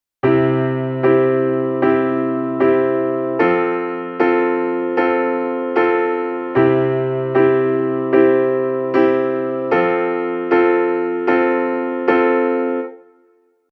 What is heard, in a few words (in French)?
balade pop